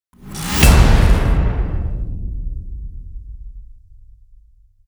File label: Game over reward riser finish; LooterKings